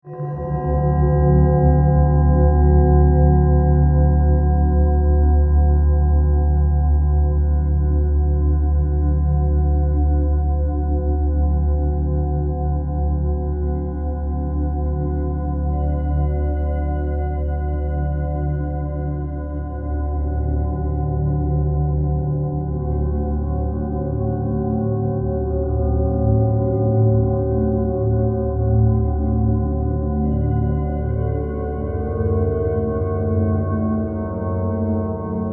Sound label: Synth, Stab, Mysterious; Bell, Long; BlastWave